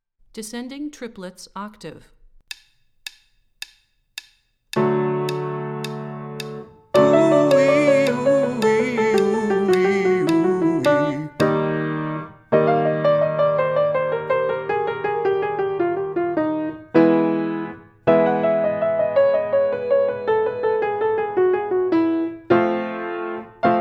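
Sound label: Descending Triplets Octave - High, Vocal Warm-ups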